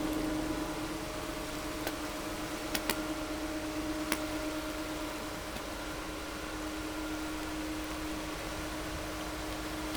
No Ship, helicopter

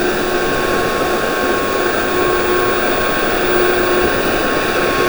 loud boat

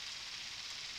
Background Noise

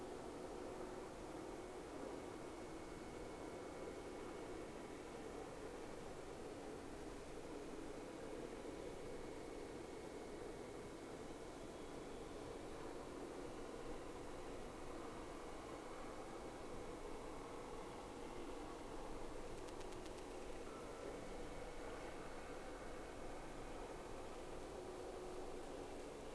Whales; SeaSnake

